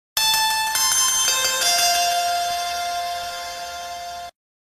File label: trevor ringtone; gta